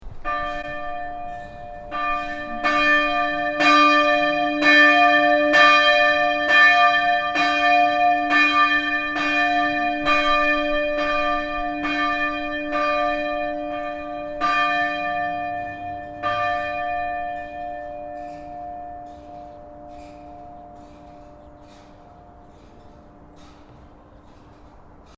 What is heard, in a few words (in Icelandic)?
grafarvogskirkja messa 30, kirkjuklukkur